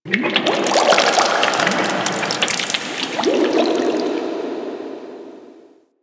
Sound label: Ocean Cave Crack Small; Warframe; Grineer Ocean